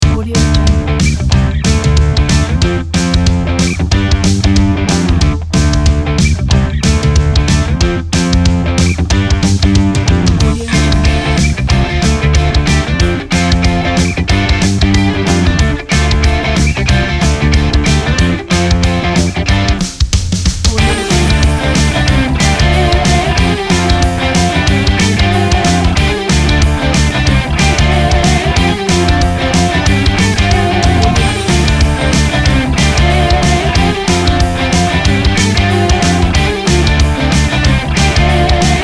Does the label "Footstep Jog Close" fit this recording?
No